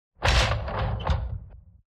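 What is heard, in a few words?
Door open close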